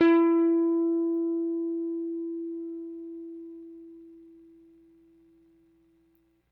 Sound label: Rickenbacker Bass; Airwindows